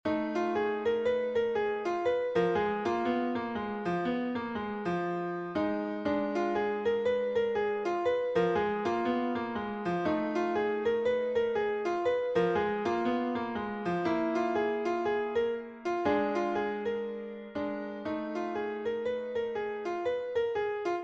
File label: F Blues Invention